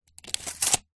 industrial tape measure let go retract

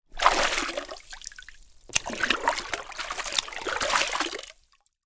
water splashes hand movements lift out

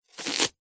veg raw cut slice chop knife

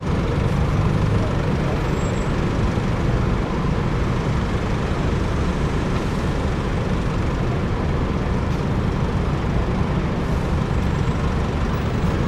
ambience london parliament square traffic people

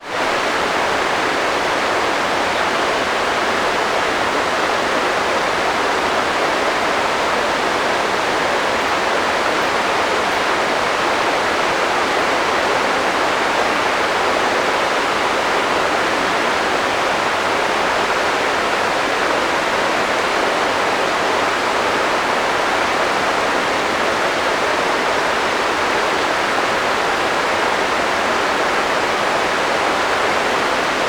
nature creek water fall distant forest

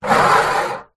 drink can drink inside slide on table

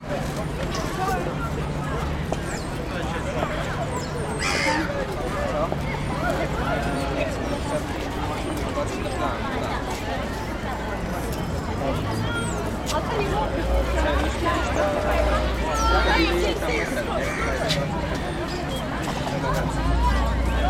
ambience london the queens walk outside cafe

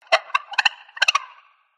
squeaky metal Designed Alien Bird; PMSFX

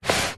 cardboard box shoe box slide carpet short

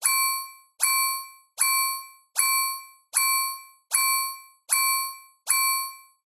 science fiction spaceship alarm

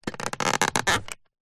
plastic thin press squash stress creak; foley

